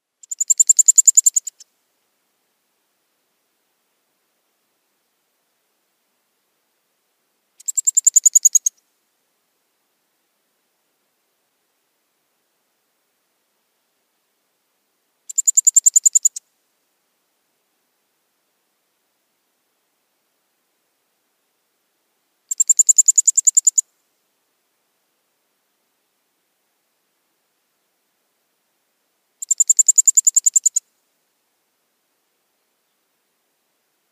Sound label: Eurasian Wryneck nestling